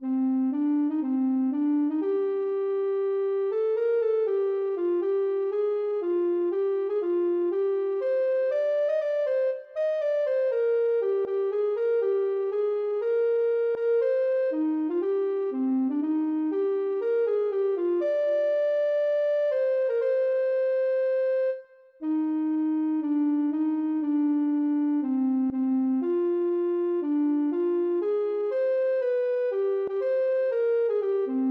ocarina

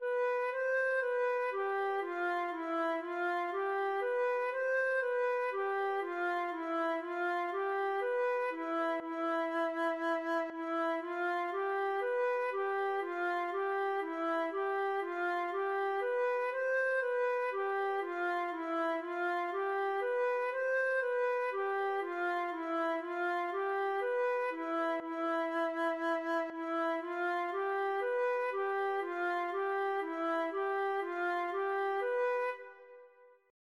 elingeling, feadóg

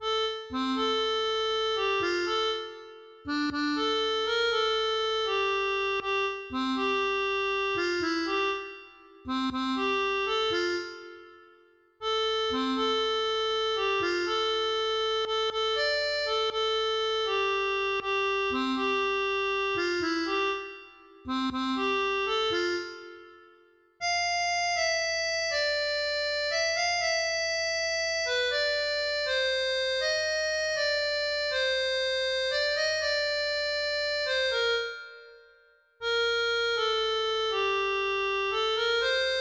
vals

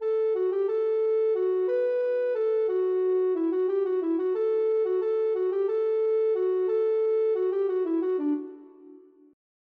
ocarina

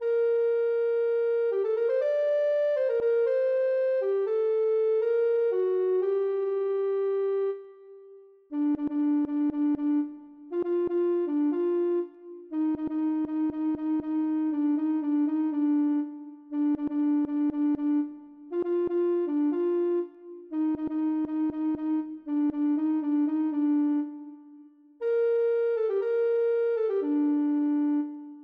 ocarina

